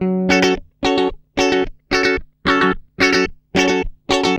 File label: GUI, 110 BPM